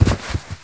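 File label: body bump; Total Chaos Nukem 3d Pack; duke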